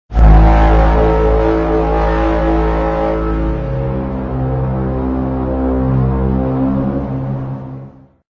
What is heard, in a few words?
Tri-pod Horn